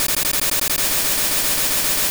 Supersonic; Pokemon; Gen 2; attack moves; GSC

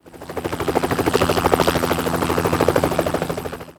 Helicopter

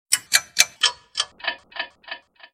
Clock Slowing; Kill Pill; Windows

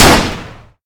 effect gunshot

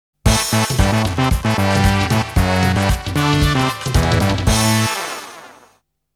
Bang Out a Drum Fill

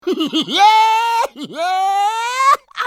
Pig laugh; Bad Piggies

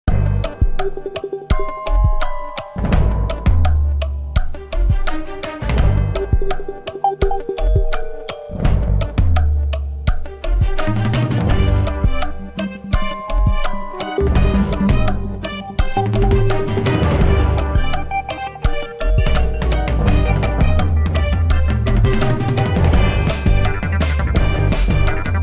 In Game Music 30 Second Countdown; Kahoot

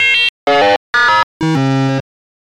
Lose a Life

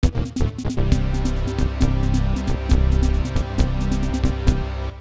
Gyrocopter - Landed Outside Target

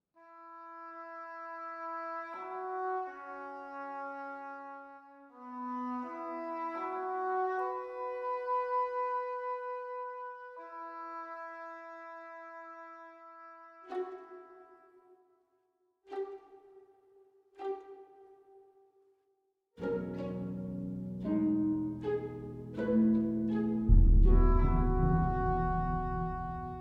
World Music

